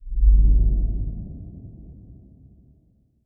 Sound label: Shortage, Whooshes